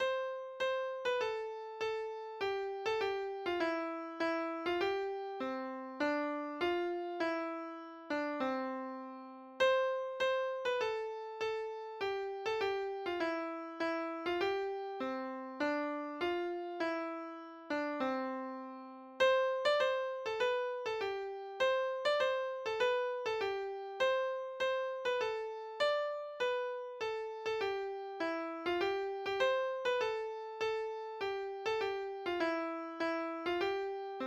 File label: Sop Midi